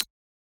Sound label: key press